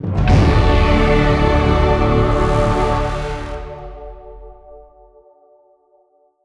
Accomplishment; sky break

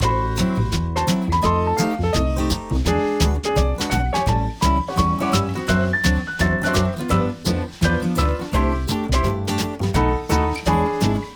Tutorial Loop; the princess and the frog; Tutorial Music

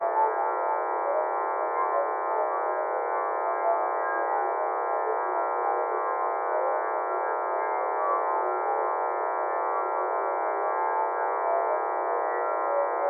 horor

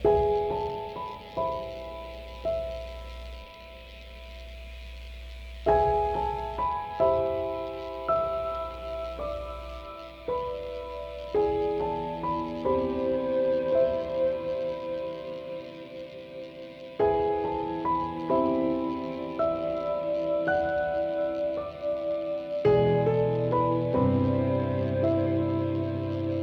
Game Start; no man's sky

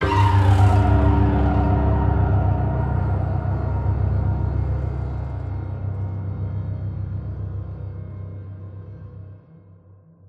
short Stinger; perception

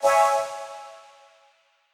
Quasar Clear B

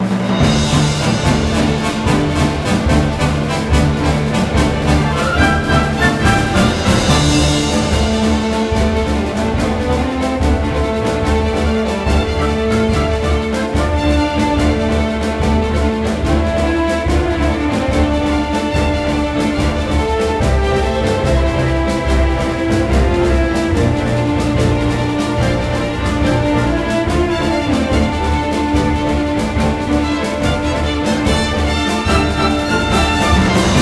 Normal Battle Intro

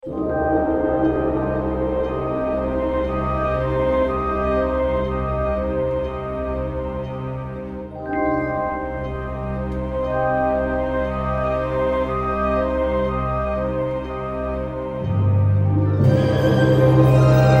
Music Main Menu; darkestville castle

